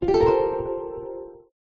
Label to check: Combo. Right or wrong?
Right